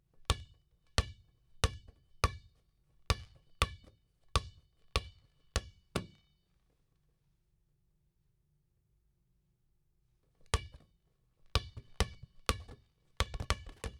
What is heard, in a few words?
Basketball